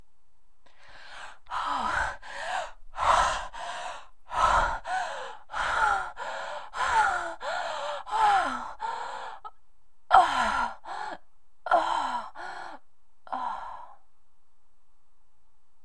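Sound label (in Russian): оргазм сильный громкое дыхание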